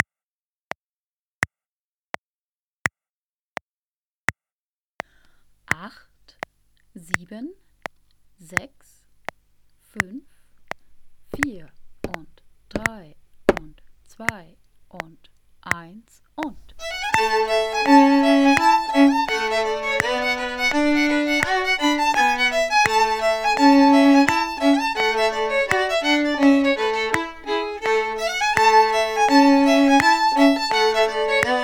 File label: Play-Along Fiddle; Streicher innen; Devil among the tailors